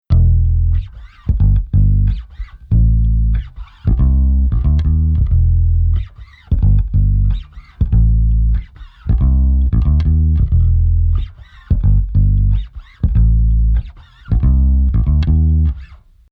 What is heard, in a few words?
bass unprocessed